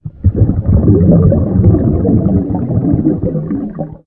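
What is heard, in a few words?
AIR BUBBLE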